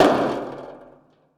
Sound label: bleacher land; Golden Crayon Sounds